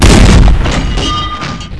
fire ding dang

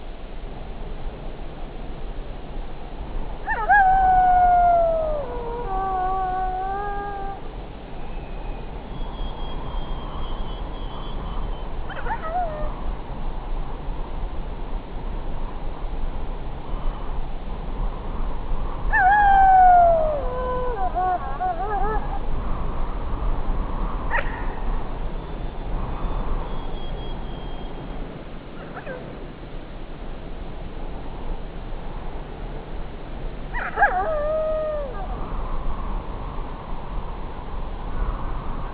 wolves

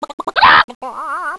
kill chicken

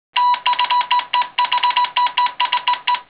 MORSE OUT

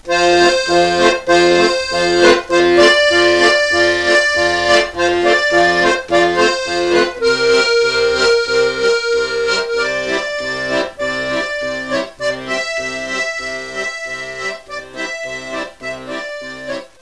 polka

